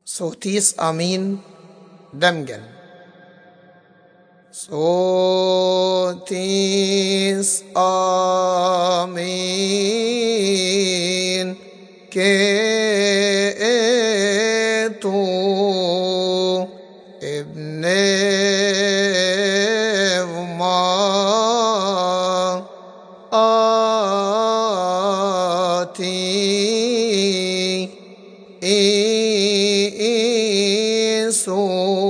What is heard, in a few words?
Sotees Ameen in its Minor Tune